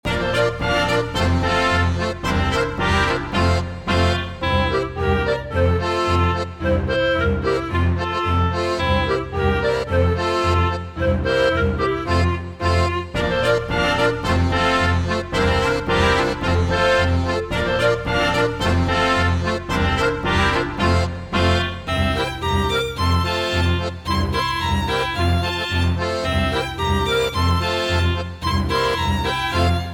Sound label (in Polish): akompaniament; Krakowiaczek jeden